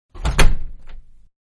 Door Front Close; Miscellaneous Doors; Front Door-Close; Sound Effects:Doors; Manhattan Production Music

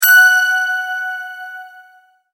multimedia tone correct; Sound Design; McKinney Sound

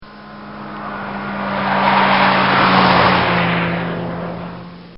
Volvo Drive By; Car Sound Effects; Vehicles; recorded in a custom built sound booth; Bobby Cole

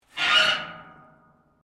METAL RUSTY SCRAPE

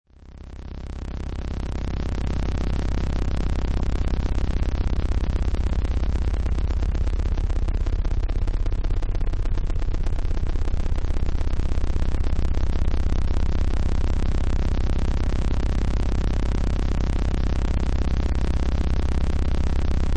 Unstable Tesla Reactor, Electrostatic; Unstable Tesla Reactor: Electrostatic, dangerous, highly charged electric pulses, transformer humming.

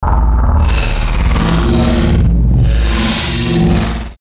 Digital Harsh Feedback Delay; glitches; Blastwave

